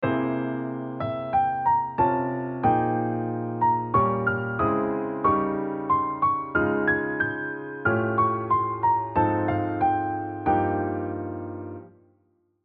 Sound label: two octave scale - harmonized with extensions from other octaves